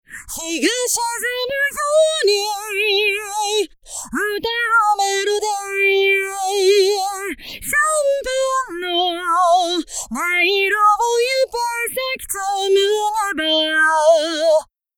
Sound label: Phaser Mix 50